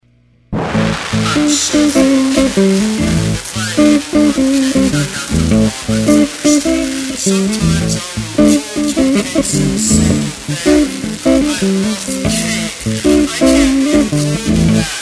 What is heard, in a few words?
Drunken Cake Blues; cake covers